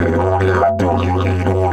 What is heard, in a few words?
F 140, DIDGE RIFFS